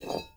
ui pickup metal hollow; Rust

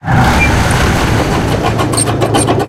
tank engine int load, engines tanks; War Thunder

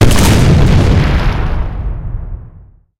tanks explosion; explosions; War Thunder

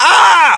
pain; ModernWarfare; localized english

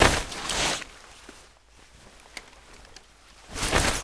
bigjump handwall; Call of Duty Modern Warfare

